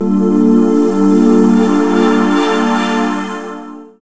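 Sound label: SYN CHD, synth chord; X-Static Goldmine 1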